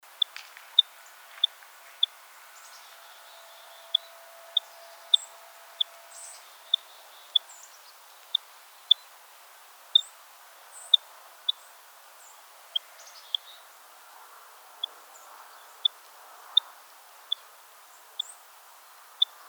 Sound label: Purple Finch, Haemorhous purpureus purpureus; finches